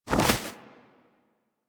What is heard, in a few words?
Bird Of Prey Wing Flap Up; Characters; Warframe